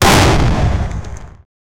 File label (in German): riesiger Feuerball, fireball